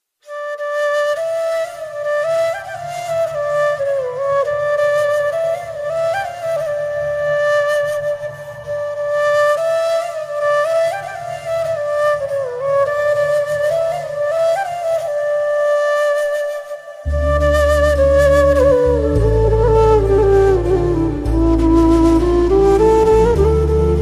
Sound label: flute bgm ringtone; aadujeevitham the goat life; tamil bgm